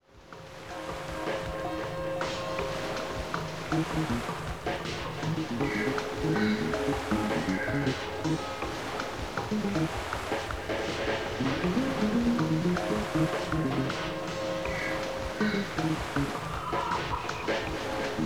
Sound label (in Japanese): ループ「海」; 音師竹内